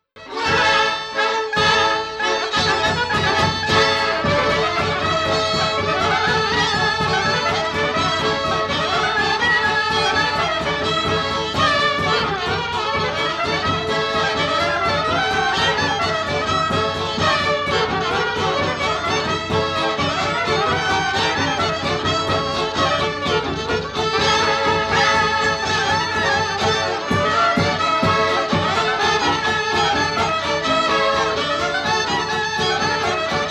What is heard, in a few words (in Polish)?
kapela; zawiślak